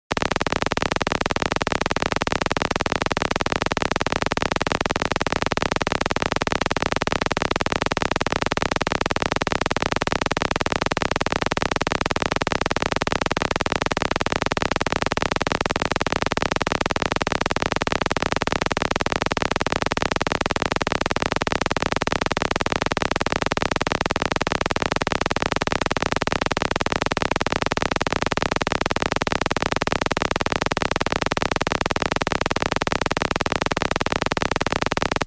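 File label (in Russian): аналоговый синтезатор ARP Odyssey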